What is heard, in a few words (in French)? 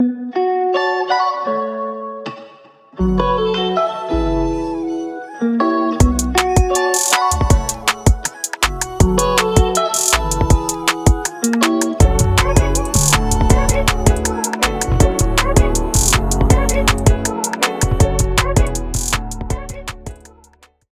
sonnerie